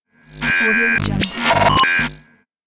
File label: glitch fx